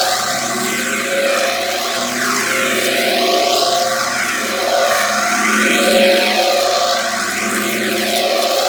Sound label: Ship Rescue Tube Loop; Gameplay; Ambience; Warframe; Corpus Ship Remaster